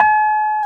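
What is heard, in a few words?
keys Rhodes, electric piano; Super E.P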